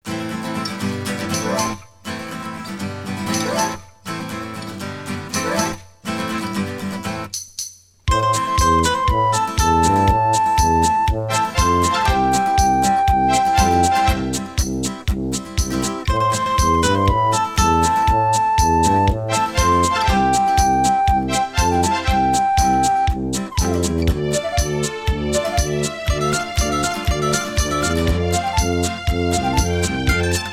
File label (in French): theme esteban acoustique